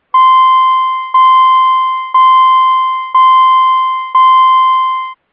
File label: Bell, Struck Rapidly 801Hz